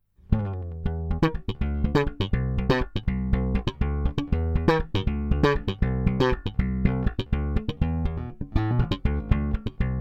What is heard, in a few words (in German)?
LiN2A E Bass frische Flats ohne Kompression